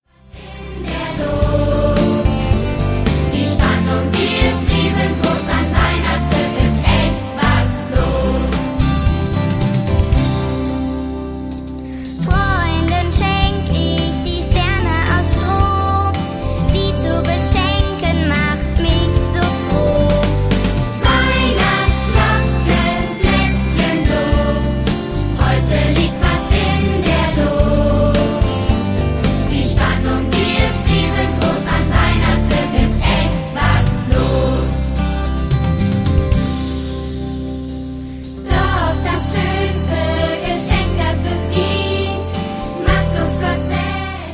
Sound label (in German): Weihnachtsglocken